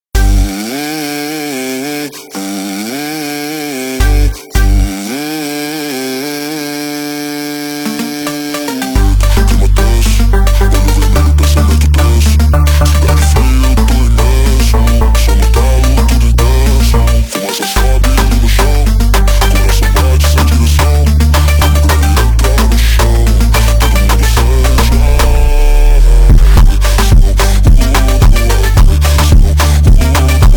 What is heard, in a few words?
FUNK GO SLOW